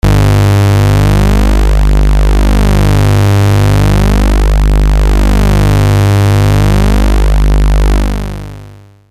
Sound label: bass with LFO; neptune